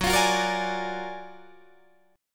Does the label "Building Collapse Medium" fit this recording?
No